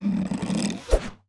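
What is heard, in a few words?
infantry archer, weapon; Battle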